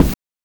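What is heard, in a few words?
BRK BD, bass drum; NES DPCM; MIDINES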